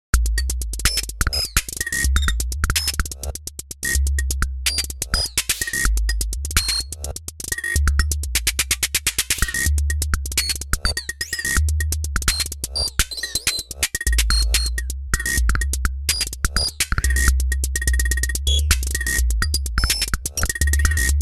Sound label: Korg Volca Drum Perc Step Jump